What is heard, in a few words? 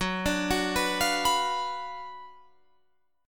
Gb Major 7th Suspended 4th Sharp 5th, Guitar Drop D